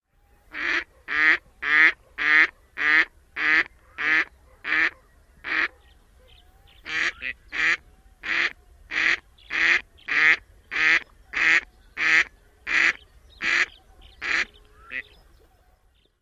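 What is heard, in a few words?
Gadwall female harsh quacks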